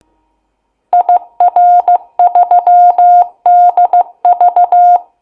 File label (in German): Kennung CW; Kronplatz